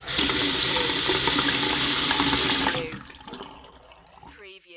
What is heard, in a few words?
Sink Faucet On Off; sound effects bible